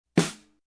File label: Snare Drum Hit